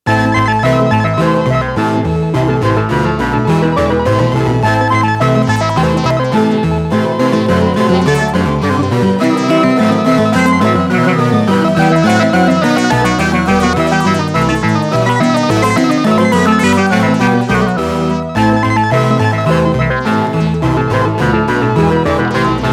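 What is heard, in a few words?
bach BWV1067 Badinerie moog bpm105; reaktor5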